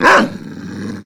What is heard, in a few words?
attack, dog